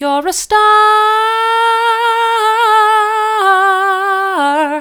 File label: your a star mid, vocal